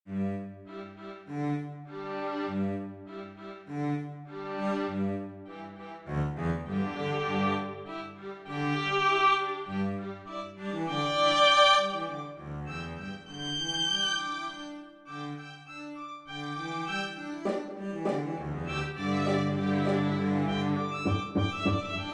String Quartet

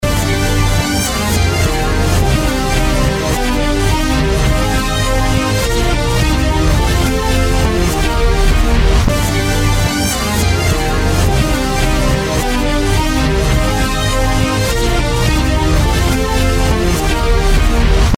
REVERSED; guessing game №2

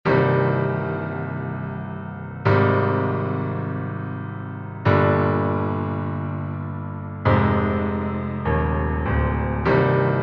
Prelude

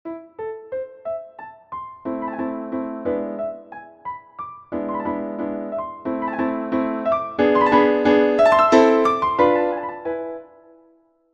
Piano Sonata in F minor Theme A minor

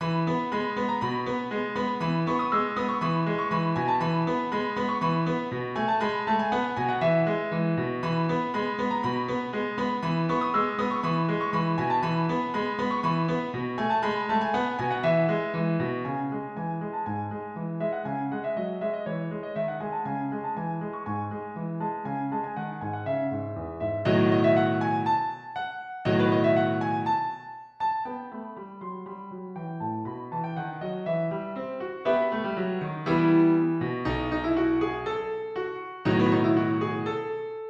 Six Morceaux Musicaux no6 in E major

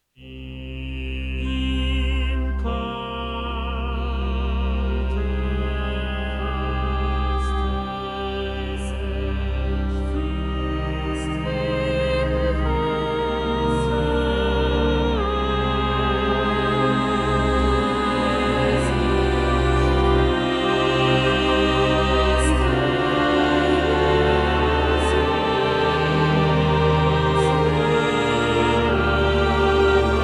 Overture for Choir and Orchestra in C minor; Der abflauende Sturm; String Orchestra; An arrangement for choir and orchestra of my Overture in C-sharp minor

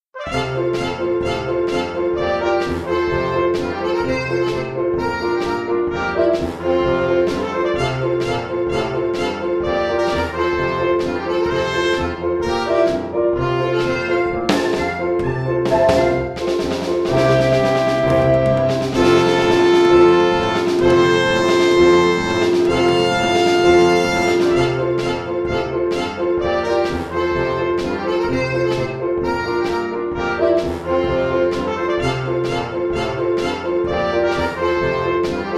Swing - Polka

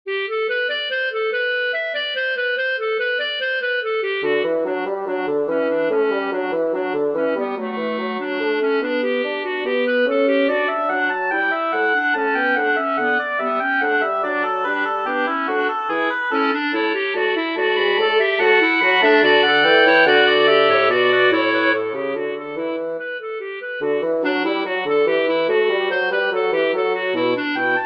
Fughetta winds

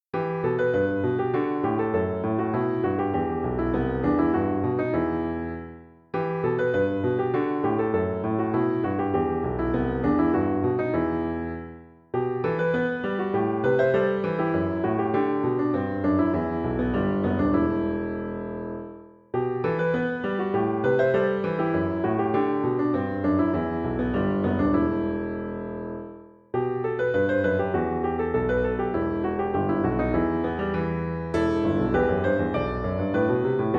Soliloquy for Piano

